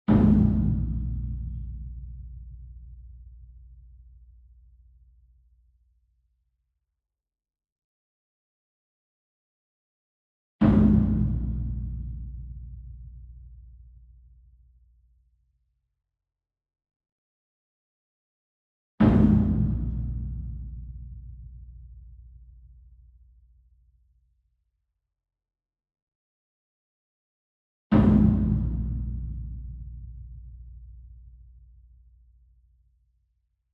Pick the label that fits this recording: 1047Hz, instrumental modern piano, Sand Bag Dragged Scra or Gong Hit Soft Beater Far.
Gong Hit Soft Beater Far